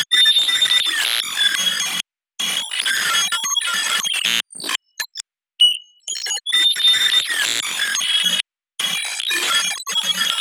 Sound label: Glitch